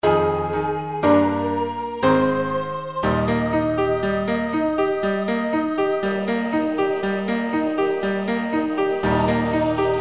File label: Sanctus piano; Faure Requiem